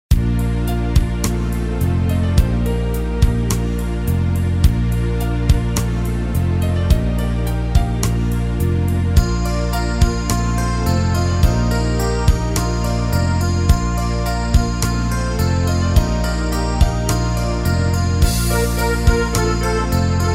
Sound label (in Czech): Karaoke